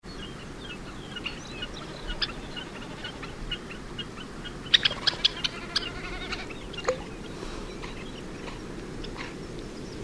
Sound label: red necked phalarope